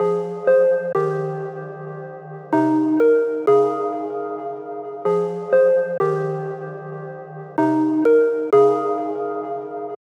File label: Pluck